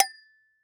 Impact Metal Object Solid Hit By Medium Metal Pipe